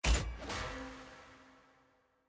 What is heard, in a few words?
artifact click; vi